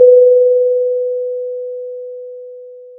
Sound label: Sound of a church bell